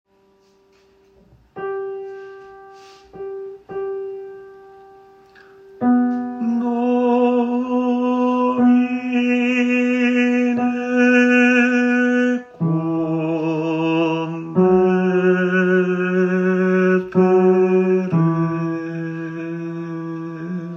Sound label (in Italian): tenore; Domine convertere